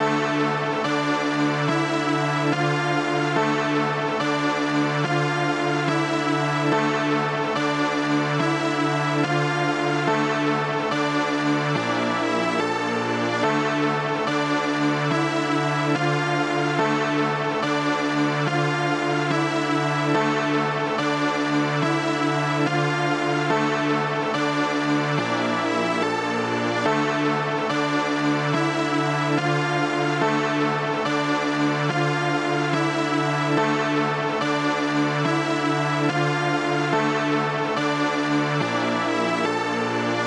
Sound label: 143 BPM D Min Strings; Melody; Loop Stems; Cymatics; 2022 Melody Collection Platinum Expansion - Pt 2; Takeover